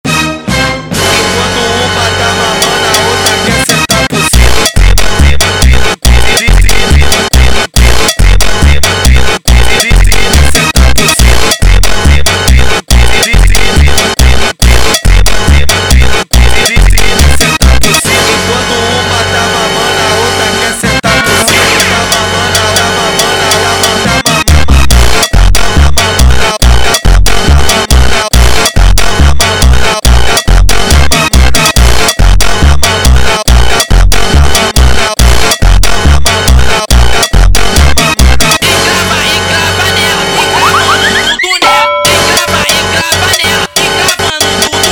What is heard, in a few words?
funk do surprise